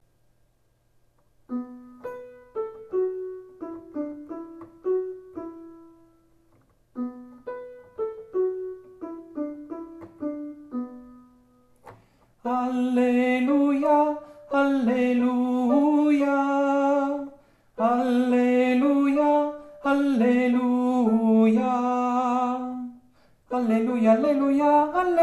Tenori Alleluia

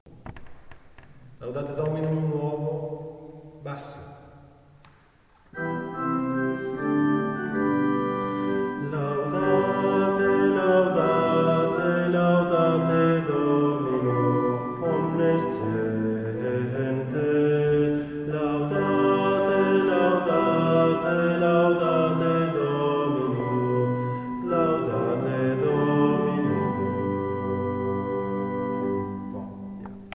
Laudate Dominum nuovo bassi; Laudate Dominum (scambio di pace)

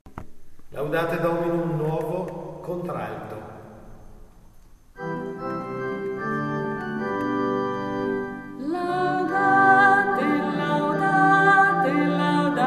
Laudate Dominum nuovo contralti; Laudate Dominum (scambio di pace)

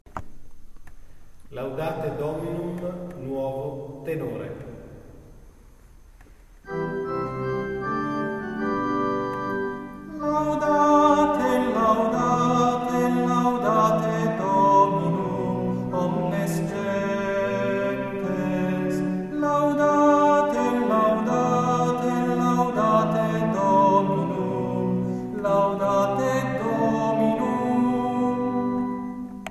tenori; Laudate Dominum (scambio di pace)

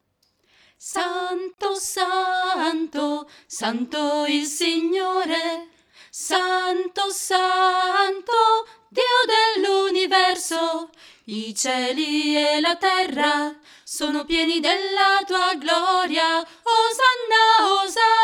soprani santo

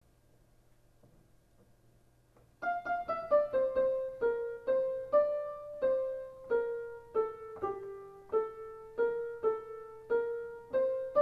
Soprani

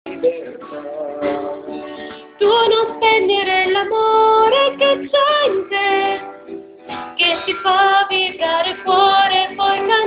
contralti non spegnere

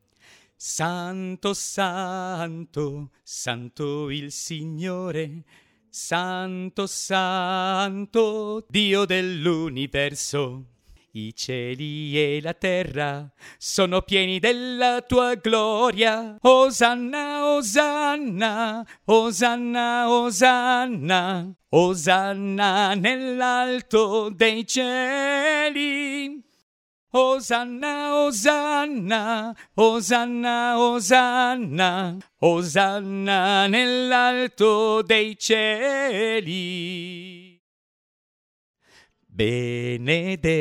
tenori santo

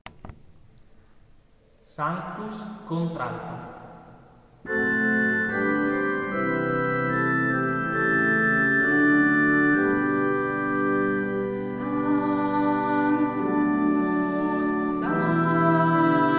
Sanctus contralti; Sanctus Lourdes

